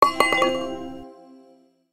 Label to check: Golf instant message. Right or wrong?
Right